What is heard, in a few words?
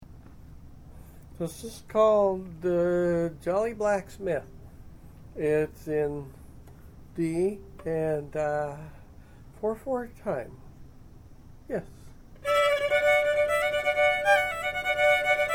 Fiddle chords, Irish